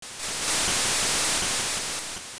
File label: Shore Long; Water on Toronbo Shore (regular and long versions)